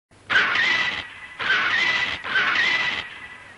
Roar; Gilmaras

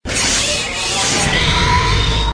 roar; Cykor

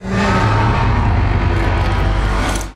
Alien Spaceship Roar

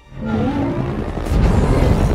Colossal Robot Roar

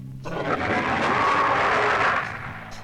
Roar; Ghoston

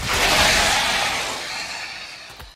Nerscylla Roar

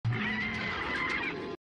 Roar; Megapede

